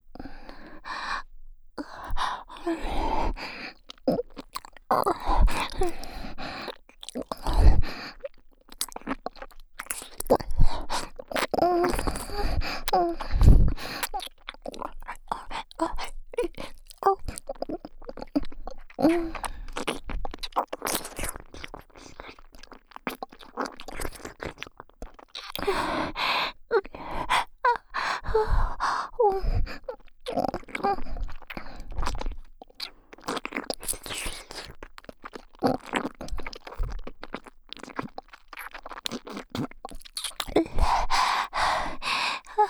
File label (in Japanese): 耳舐め騎乗位ループトラック, ASMR